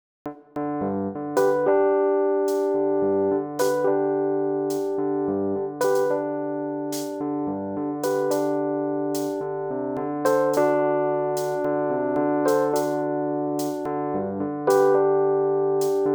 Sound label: mellow epiano strings